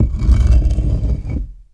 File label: open